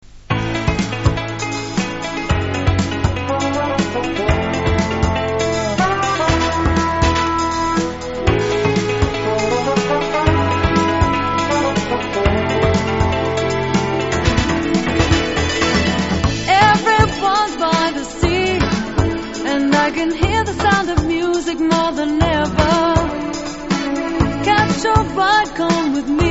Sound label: SAMBA